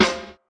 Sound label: Steel Snare Drum C Key, acoustic snare sample tuned to the C note